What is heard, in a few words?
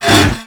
Derelict Mover Malfunction Start; Orokin